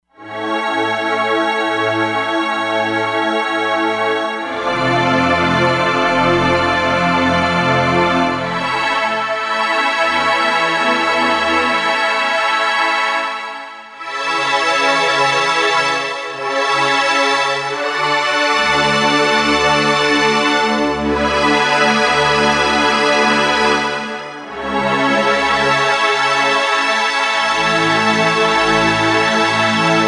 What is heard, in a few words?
New Jerusalm, E-mu Proteus-3; Factory patches